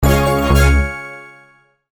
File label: level up